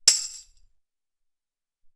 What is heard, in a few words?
Tamb Op